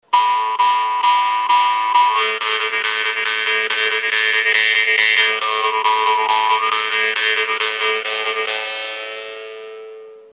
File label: Mini Dan Moi